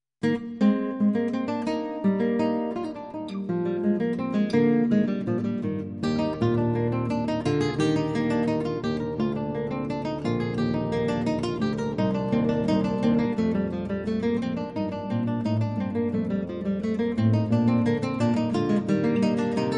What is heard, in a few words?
bourree, guitar